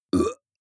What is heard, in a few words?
burp grunt short; koerpergeraeusche; menschen; soundpool